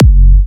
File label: Big Kick